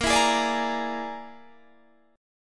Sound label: Bb 7th Flat 5th Bb, Guitar Half Step Down